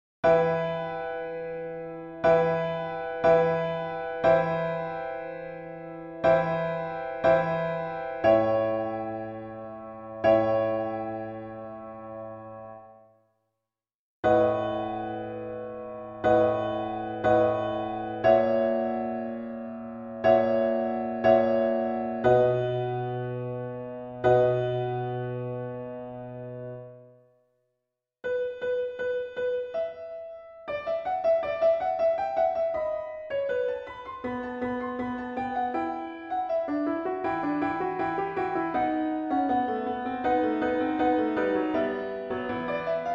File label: Piano Only; Gratias agimus tibi and Propter; Gloria; Vivaldi